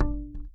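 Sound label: acoustic bass dead note; Masterbits CLIMAX 7 - Bass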